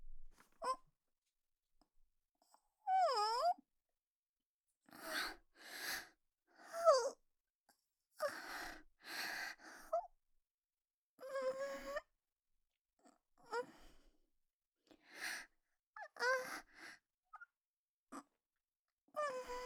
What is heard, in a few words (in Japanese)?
喘ぎ声（小）